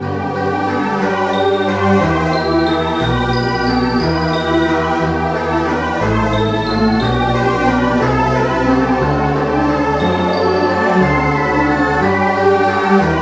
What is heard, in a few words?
goblin